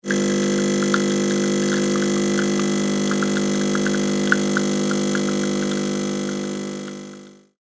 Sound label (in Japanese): 家庭用エスプレッソマシン抽出音